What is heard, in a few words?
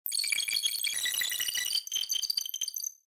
Computer Data Streaming Effect; Computers; Stuart Duffield